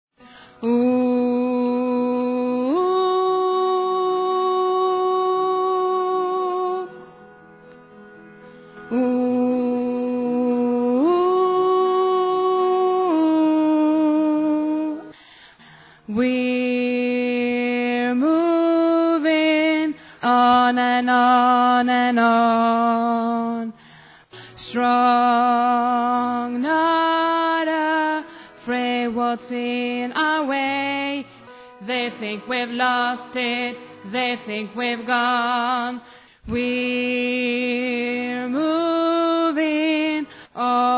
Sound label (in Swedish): alt, stammor